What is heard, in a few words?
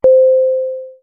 short chime